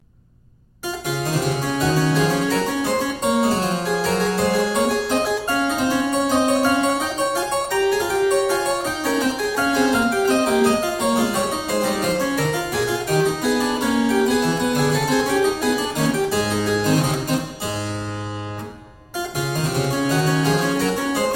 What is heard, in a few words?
Suite Courante, Harpsichord